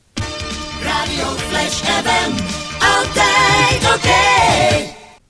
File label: jingle; Flash